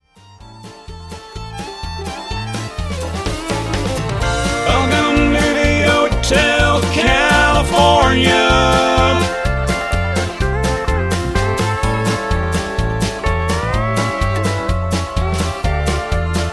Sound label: harmony